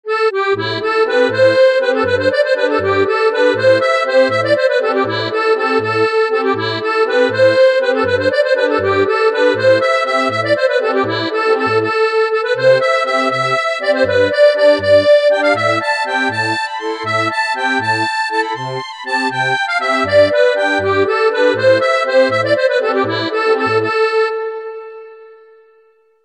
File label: harmonica